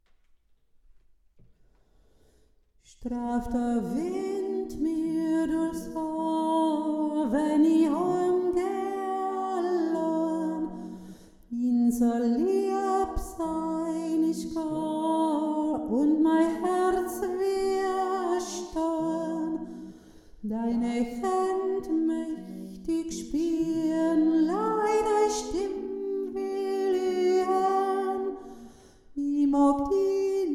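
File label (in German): deine hand hauptstimme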